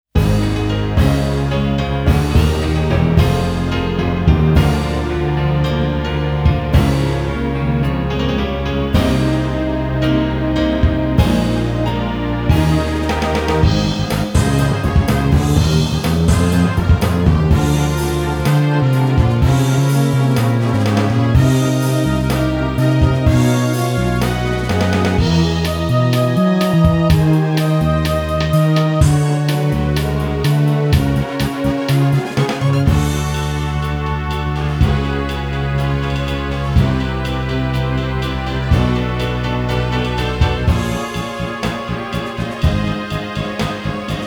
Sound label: Ending BGM; Ending (FC SEGA Won) BGM